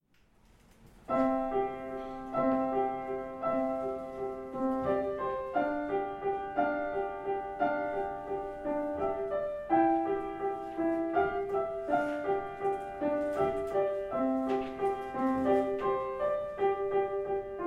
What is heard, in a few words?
Music Box Waltz; Gurlitt